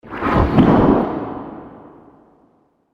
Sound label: Heavy Movement In The Dungeon; fantasy sound effect; Andrew Fleming